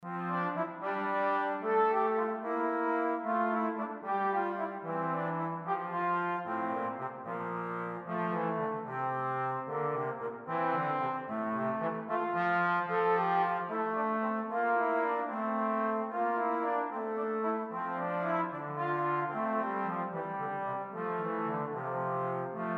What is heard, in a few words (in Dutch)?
Duetten voor trombone; Duet 4